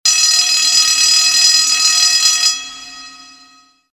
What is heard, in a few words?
bell